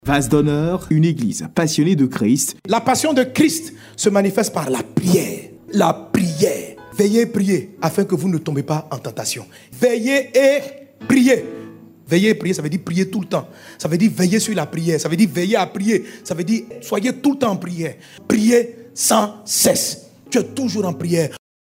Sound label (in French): REVEIL SON